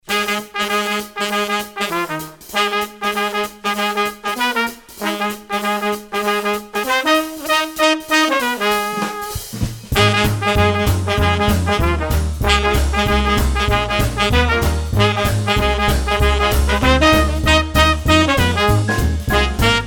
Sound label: Jazz Band